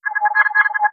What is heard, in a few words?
Ring